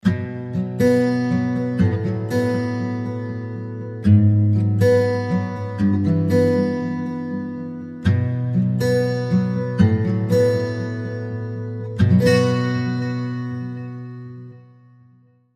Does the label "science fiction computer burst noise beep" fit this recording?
No